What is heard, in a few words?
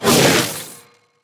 portal open no Laser; sfx